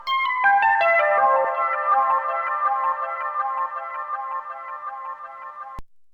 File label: Bell, Deurbellen